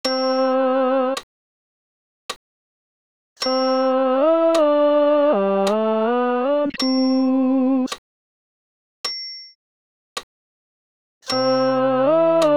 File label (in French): Sanctus ten voc acc